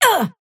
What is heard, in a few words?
pain small